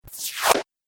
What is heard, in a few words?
Droid Suction Cups, robot